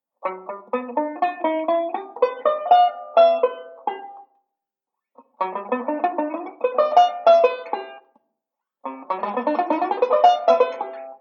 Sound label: Octave Arp, banjo; Beyond Chord Melody Volume II